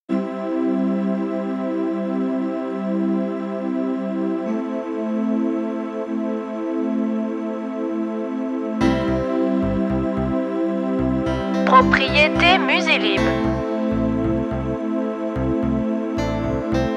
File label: smooth wait